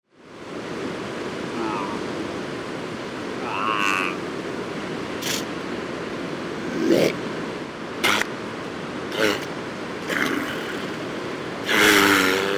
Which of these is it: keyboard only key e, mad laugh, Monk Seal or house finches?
Monk Seal